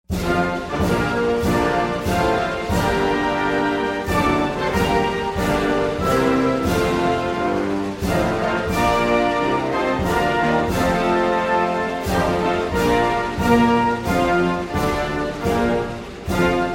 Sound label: national anthem army